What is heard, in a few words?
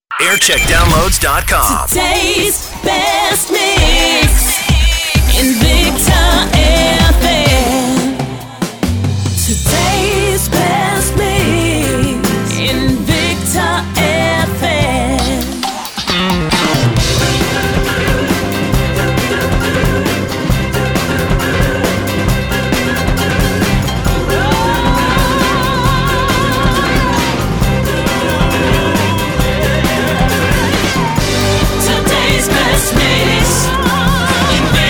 jingle montage; invicta fm